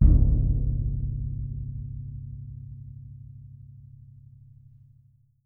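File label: bass drum, Percussion; Miroslav Vitous - Percussion & Harp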